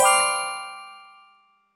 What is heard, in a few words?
letter pickup; freepixel; WordJourney